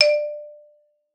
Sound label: D4, XYLOPHONE; Xsample VOL-4